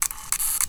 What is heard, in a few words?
camera point shoot